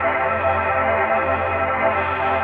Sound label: shadow loop; Magic Arsenal